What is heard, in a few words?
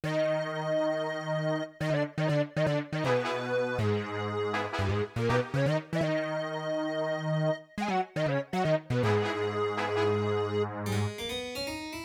Fight Melody